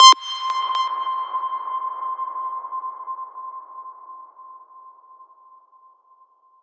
Synth

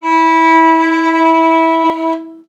Violin E; Instruments; 7rystan's 700+ FREE COLOSSAL EDM SAMPLE PACK

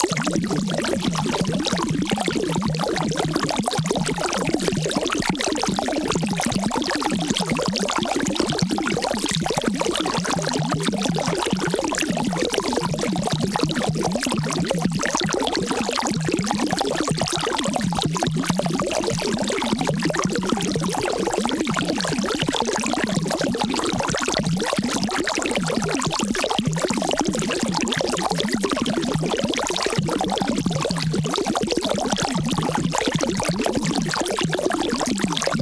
water; base pack

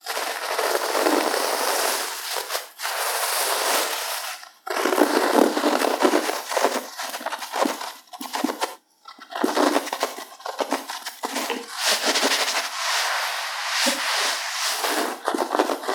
ASMR Shuffling; SFX; Atmosphere; 7rystan's 600+ FREE EDM SAMPLES & DRUMS

